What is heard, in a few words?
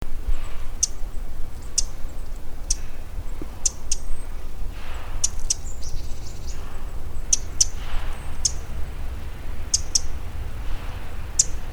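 misosazai, wren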